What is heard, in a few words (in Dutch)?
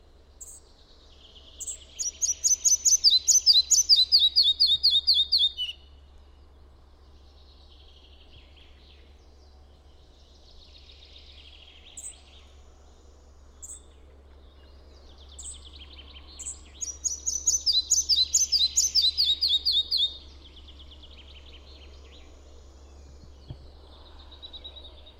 Vlliegenvanger Ficedula parva